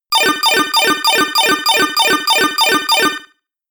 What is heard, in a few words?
win